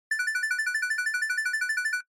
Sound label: Censor Bleep Synth, Mid